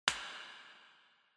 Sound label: Hand Foley Clap Reverb